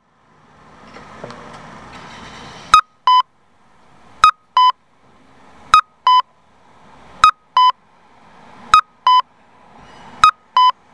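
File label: cuckoo, traffic signal; onkyo kosaten; aichi; inazawa; konomiyaekimae; nagoya U-TK-1; kakko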